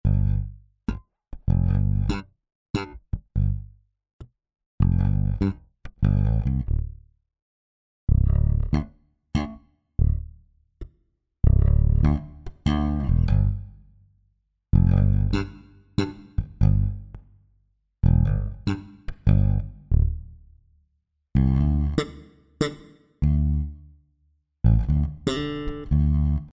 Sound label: Bass Mid Room; Yardstick